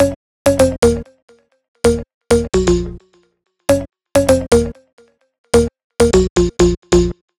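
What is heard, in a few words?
Bass Loop 130 B flat minor, Downtown House; Bass Loops 130bpm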